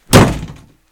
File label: Door Slam